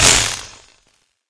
electricity; smite; chaac

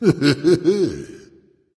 heh heh, voice; smite; voicepack; ymir

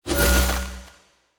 projectile; smite; merlin

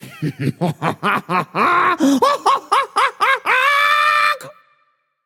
laugh, voicepack; smite; jorm

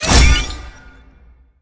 pwr charge; smite; tiamat

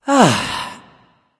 nv sigh; smite; voicepack; xbalanque

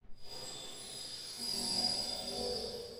nightmare glide chimes; smite; thanatos

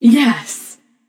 yay passion; chaac

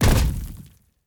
atlas footsteps; smite; god atlas

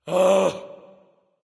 "ugh"; smite; voicepack; ullr

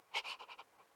victory dog breathe; smite; god daji skin15

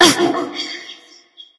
grunt jump light; smite; voicepack; hel

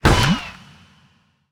umbrella run impact; smite; vamana

